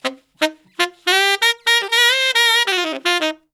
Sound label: SAX SOLO; Zero-G; Phantom Horns